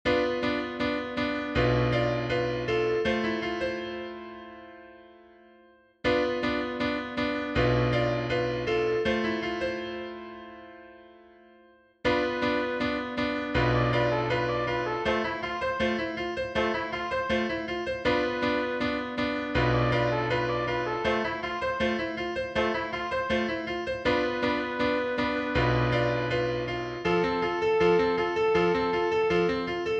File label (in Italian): DUO PER TROMBA E PIANOFORTE